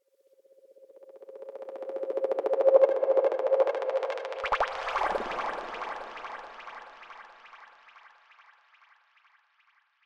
Blep Laser

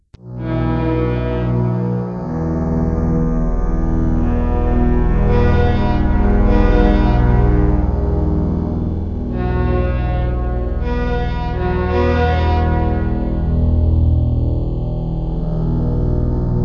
Orchestral

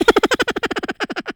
High Pitched Laugh

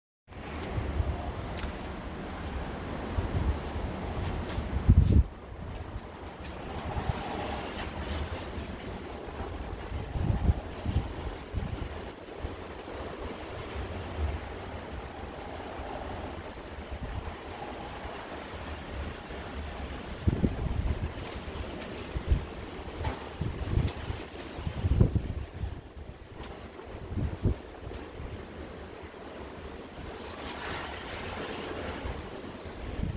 Strong Blizzard

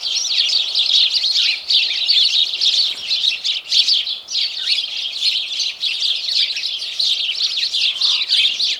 Sparrows Tweeting Loop

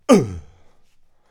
Fight Shout Man Hit

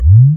Woop

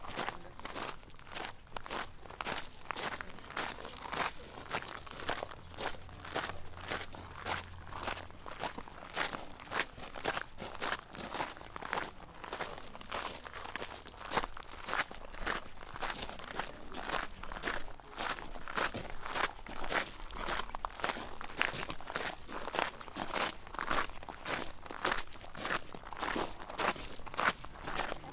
Passos Na Brita Walk In Crushed Stone

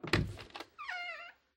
Wooden Door Open

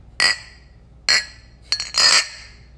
Glass Cheers

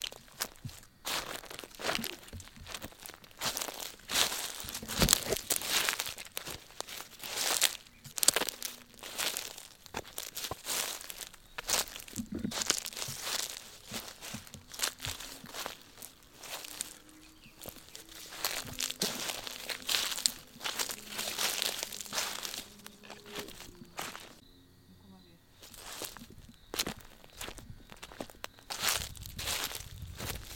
Wet Grass

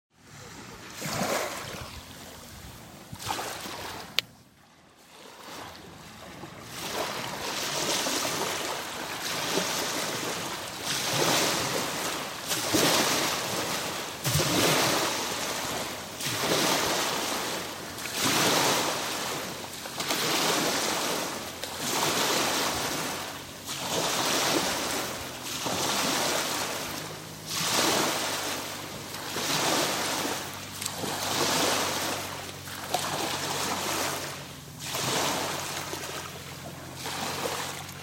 Water Splash Beach

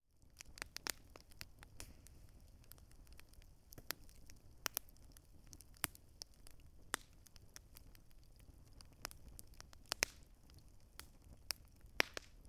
Campfire

